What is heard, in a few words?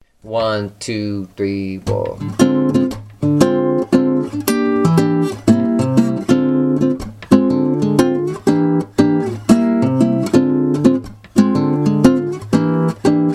melodic minor mode VI play along, guitar